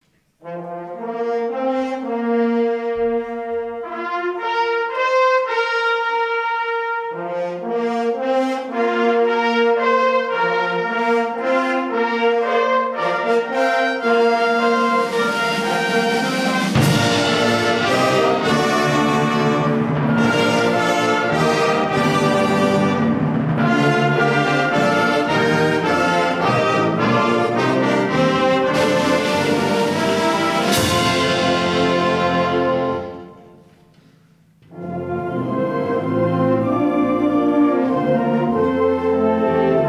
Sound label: Flourish for Wind Band